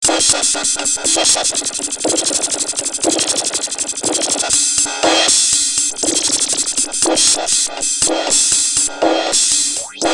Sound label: phaser; rockphaser